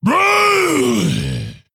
Male Fel Orc Death

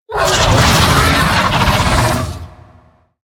Crazed Netherwing, Play

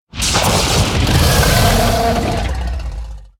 Storm Drake, Play

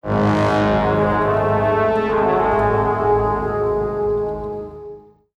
Marsh Play Stinger

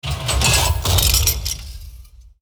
Mechanical Arm Death Underlay

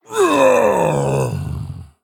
Male Orc Death

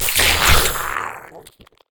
Ravenous Cave Crab Attack

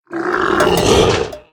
Teacher's Pet, Attack

